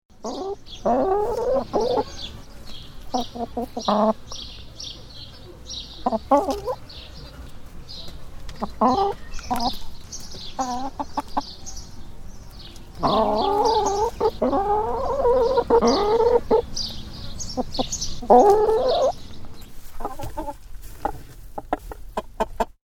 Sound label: Sound of chickens in coup.; Birds; Animals